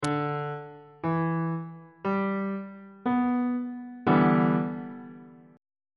d double sharp minor 7th 3rd inversion on bass clef